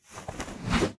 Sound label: wizard apparation sound effect; soundscrate